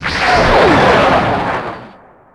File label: swoop